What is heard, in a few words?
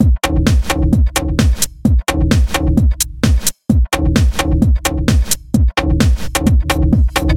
Bamboo Hammer; UnconciousPilot